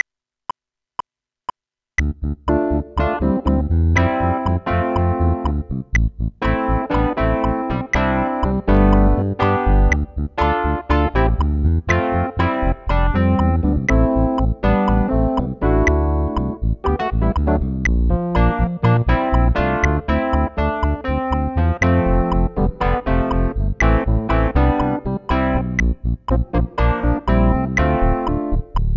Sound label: 121 BPM impro